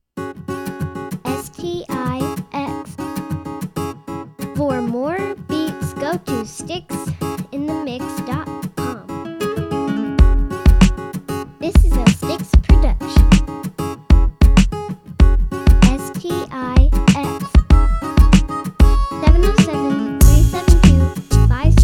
guitar yamaha

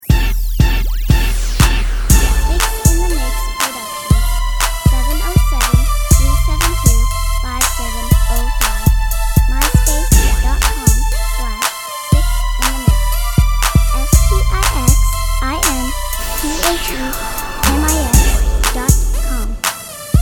funky

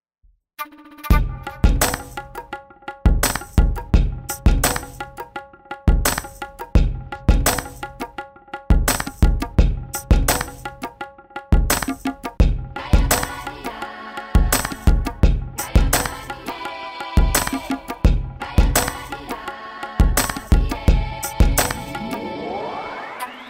jungle

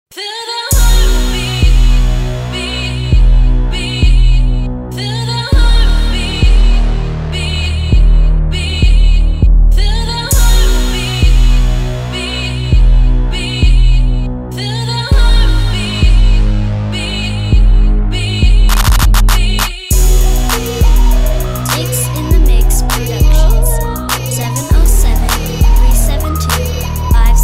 heart beat

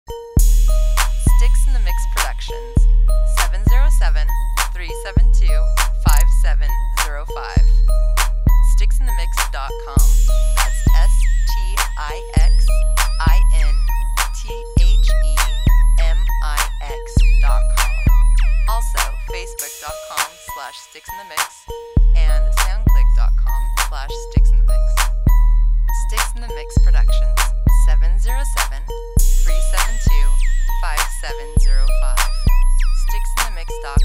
BELLS N BASS

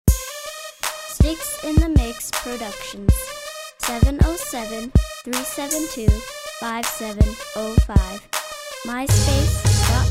got crunk

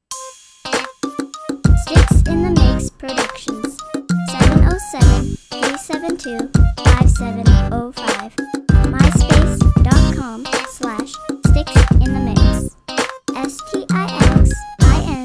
flute; flute neptune

